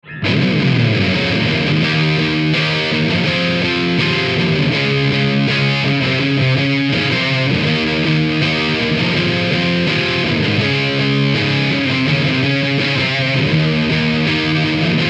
X-DRIVE BIG FUZZ; xgear

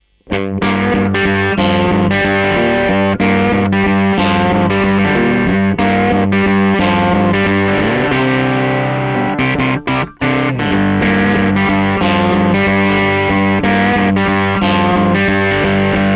riff AMP; machine modeling

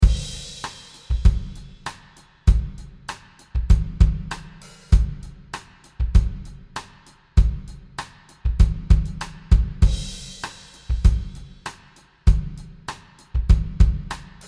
Pop Drum Chamber; sunset sound

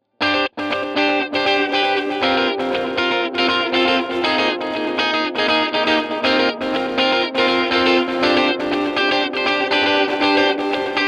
tape echo saturated repeats

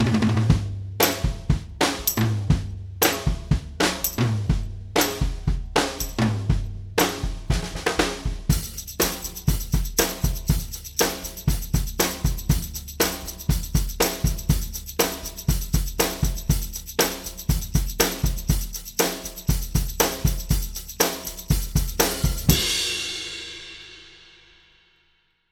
linear phase EQ brighter drums

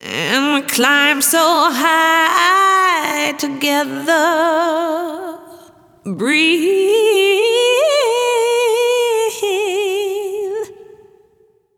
Airy Vocals

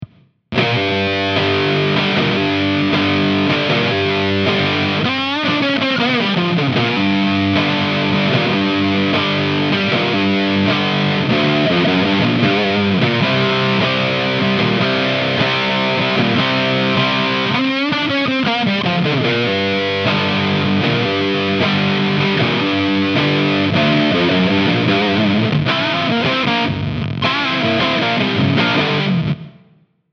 DRIVE OCTO FUZZ; xgear

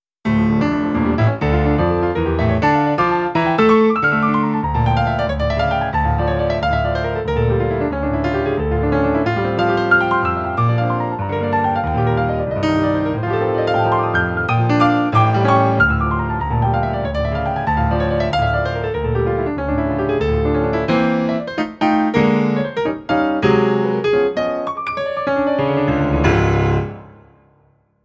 classical intro C grand close mics; SampleTank 4; piano keyboard

